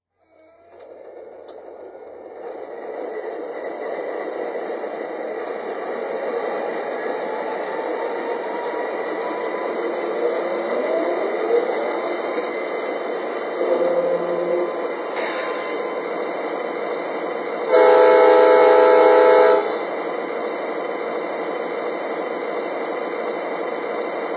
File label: es44, trains